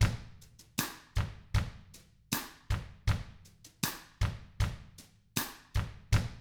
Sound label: COUNTRY, Drums; ILIO; Double Platinum Drums 1